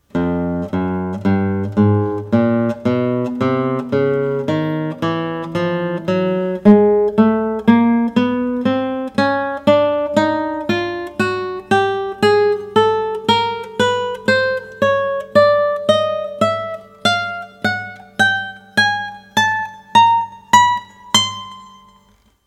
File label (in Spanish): escala, guitar